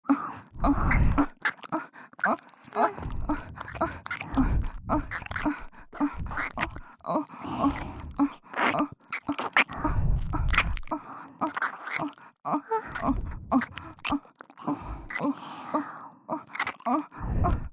オホ喘ぎ + 両耳キス; エミィ; ソフィ

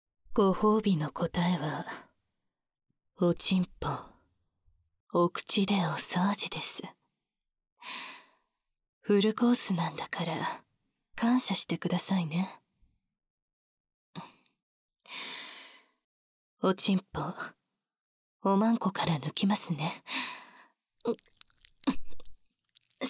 ぺろぺろ 耳舐め えっち, ASMR; お掃除

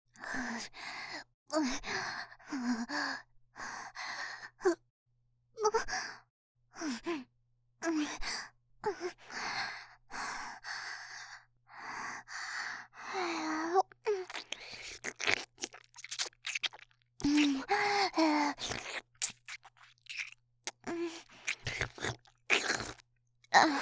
キス; 【マゾハーレム】

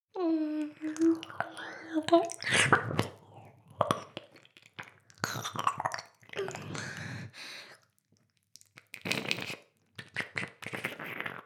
耳舐め, ASMR; 全妹計画２; メスガキ妹; 全妹計画<オール・シスターズ> 2妹め

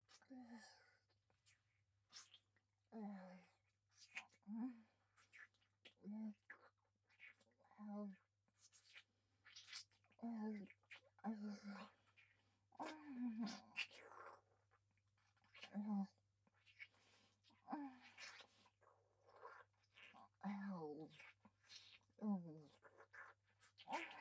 フェラ

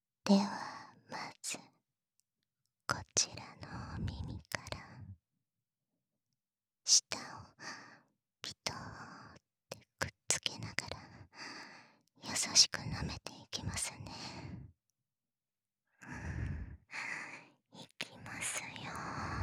耳舐めパート, ASMR

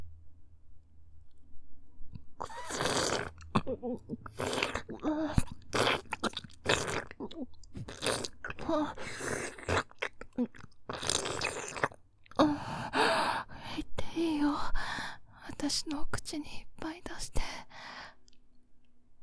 フェラ 音; 10秒いっていいよ私のお口にいっぱい出して

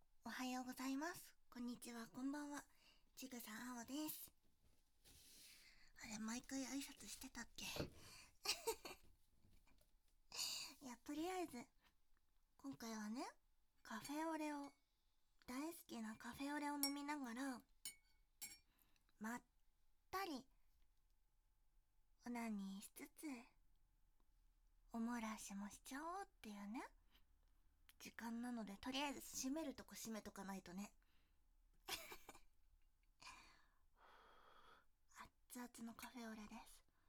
お部屋でカフェオレ飲みながら乳首いじって洗面器にまったり放尿！(バイノーラルマイク収録); 実演音声

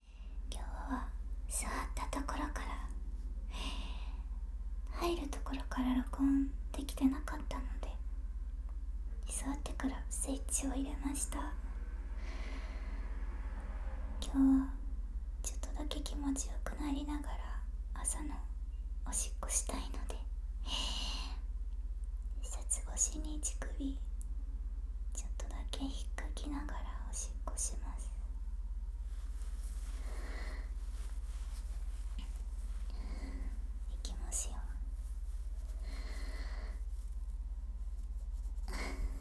おしっこ シャツ越し乳首オナニー; 7日目のおしっこ; 寝起きおしっこ11日分

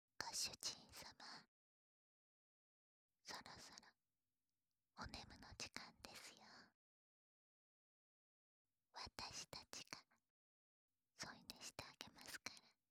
528hz 安眠向け 30分 耐久 耳なめ; ソルフェジオ周波数528hz; 両耳同時耳なめ

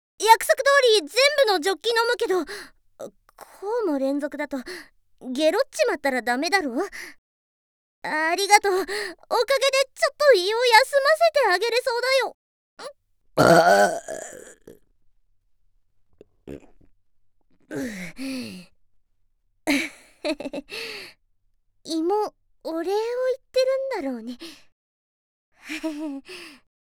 ゲップ; ザーメンゲップ集; 胃の休憩感謝ゲップ♪

